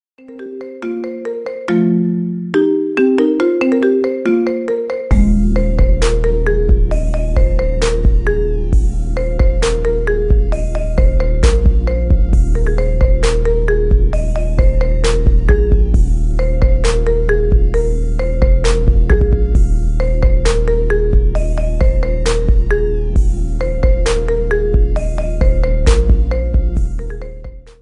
marimba ringtone remix

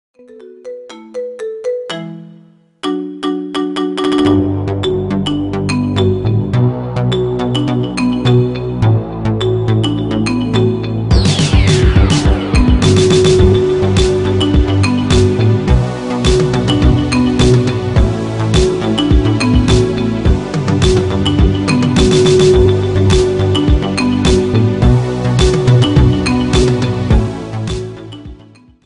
marimba ringtone remix

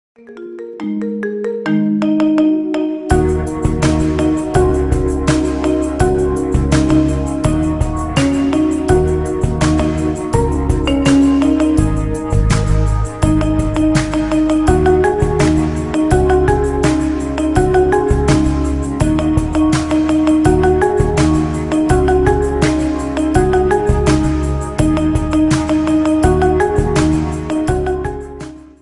marimba ringtone remix

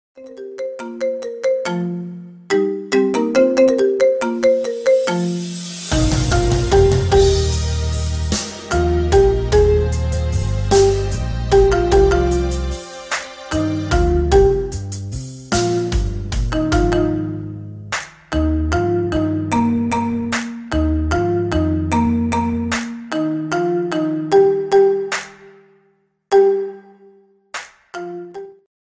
marimba ringtone

